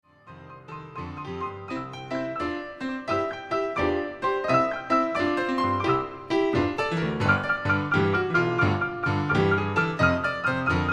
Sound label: Organ Grinder